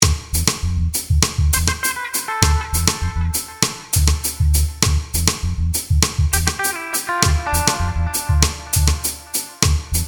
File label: Rock intro